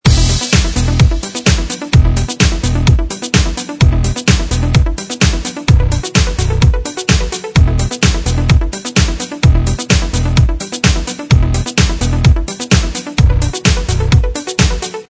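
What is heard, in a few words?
Fashion Show Intro Loop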